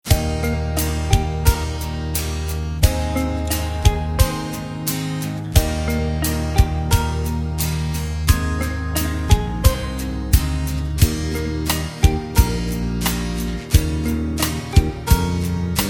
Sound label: No Backing Vocals; Eb